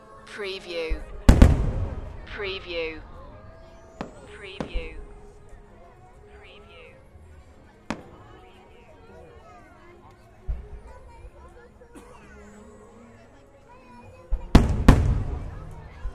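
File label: Fireworks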